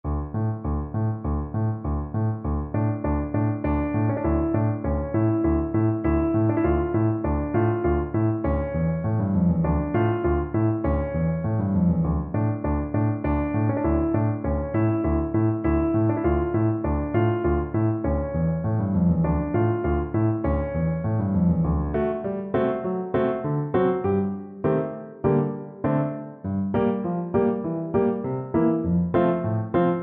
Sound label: danses des cygnets, Tuba